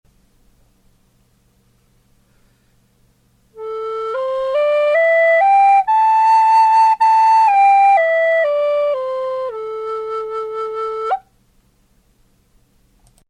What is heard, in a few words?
fin A, native american flutes